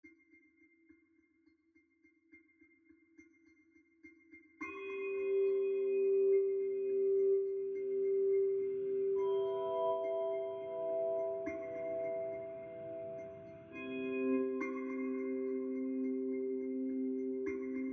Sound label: dark kalimba